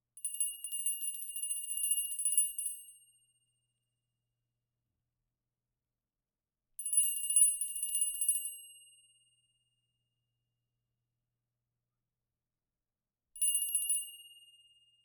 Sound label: Bell